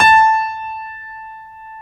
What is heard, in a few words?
Stereo Grand, Keyboards